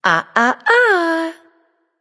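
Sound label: disagree, female; smite; voicepack; hera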